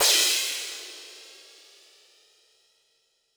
Korg 01W MegaDrums; drum machines

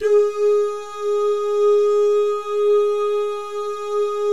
DOO GUYS, Vocal Jazz FX

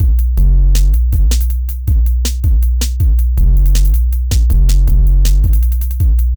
KICK, Boom da Drumz; E-MU Producer Series Vol. 6 – Analogia Project

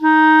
WND CLAR, Clarinet Sh

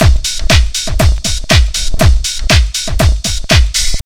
house loop

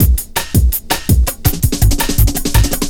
165, Drum Loops, Drum & Bass; 90 s Sample CDs; Total Drum&Bass

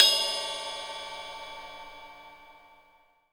18 OLD RIM, RIDE

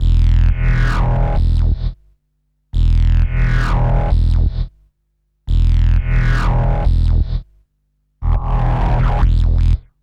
Wild Bass, Drum & Bass; Fluid Dynamics

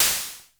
PER, TECHNO PERCS; Crypton - DrumBase5000

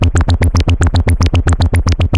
machine burps, VCS beats; Zero-G Ambient 1

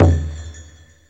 JANGLE DRUM, ETHNIC DRUMS; Zero-G Ambient 1

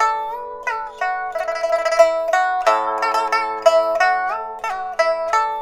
PIPA, 90; INIS - Opium; PIPA 90-D

